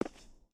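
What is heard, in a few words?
Foot Concrete Sneaker Walk, footsteps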